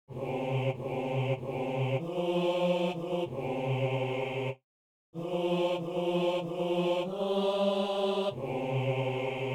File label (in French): hommes; Psaume 90 (91); Messe du 17 janvier à Saint-Denis